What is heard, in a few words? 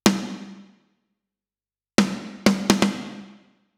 Snare; Reverbrate